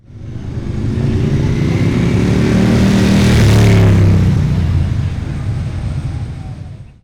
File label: Ducati driveby l2r; ducati music challenge